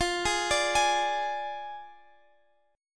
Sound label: F Minor 6th F